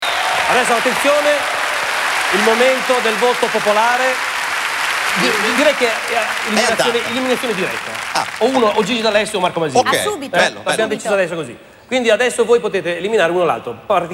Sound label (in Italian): applausometro